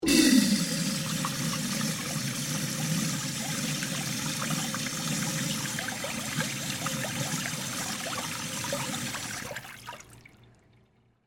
Urinal Flush, Office, Urinal, Flush, Public, Bathroom; Public Bathrooms; BlastWave